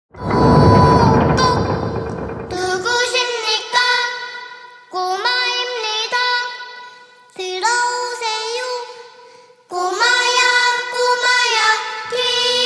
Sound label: Phonk JUMP ROPE FUNK